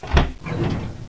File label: door move; doors; aomdc